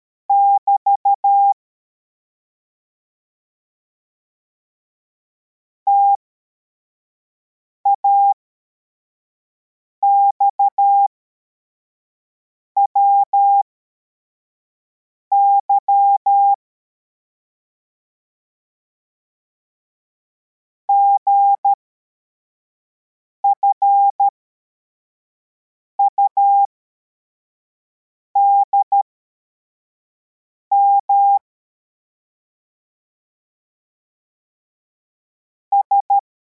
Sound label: cw, morse